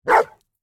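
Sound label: mix Rhodesian ridgeback bullmastiff, dog barking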